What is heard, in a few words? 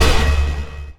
Stab